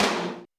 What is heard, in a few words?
Tom Md Aggrsv, Alesis D4